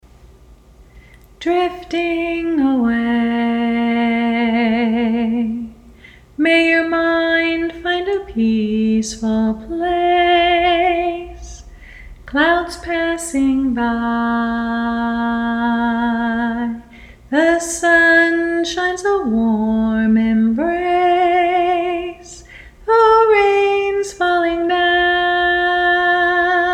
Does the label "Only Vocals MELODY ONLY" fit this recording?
Yes